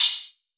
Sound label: Metal Crotale Hat; Airwindows